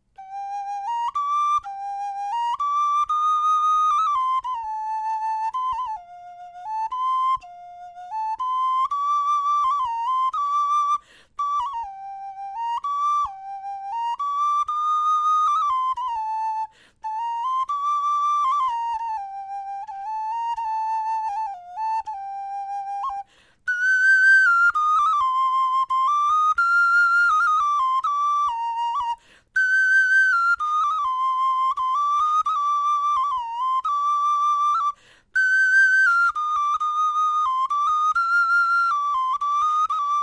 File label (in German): melodie